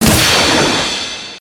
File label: bonus chance; Billyonaire